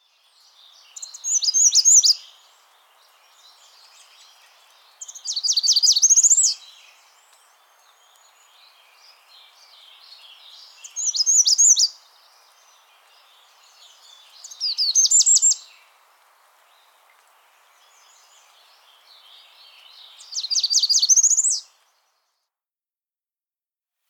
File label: American Redstart